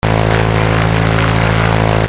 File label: flight